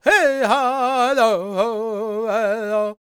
C# MIN, World Voices, LAKOTA

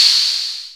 PRC CLAP; Roland L-CD701

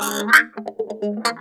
TOOTHPICK, Funk Guitar; 90 s Sample CDs; Zero G

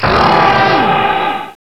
voice hit; Rubber Chicken Software Sample Bank

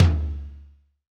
R-8 dry tom; Drum Mashines; EdgeSounds; R-8 DRUMS

